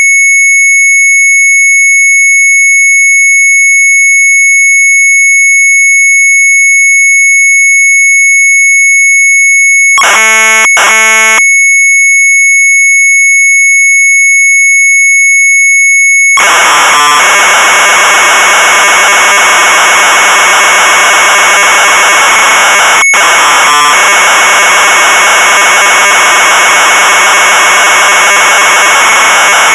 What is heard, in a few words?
Sharp MZ800 Tape